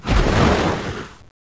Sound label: miss